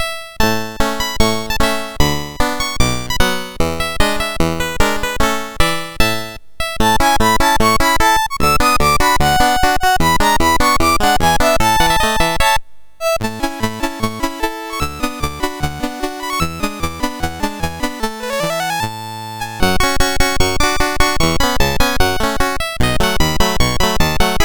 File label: Band, MOS6581R4